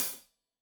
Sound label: Hat Loose, Hi-Hat, Cymbals Stereo